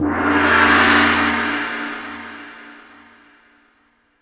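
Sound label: Yamaha RS7000 hi-hat cymbal G7; drum machine; Yamaha RS7000 Cymbals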